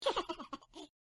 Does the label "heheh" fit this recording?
Yes